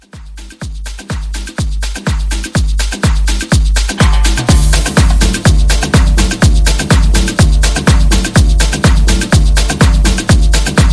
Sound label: Salsero Re Drum 124